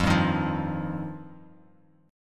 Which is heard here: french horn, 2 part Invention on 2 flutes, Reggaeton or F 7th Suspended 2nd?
F 7th Suspended 2nd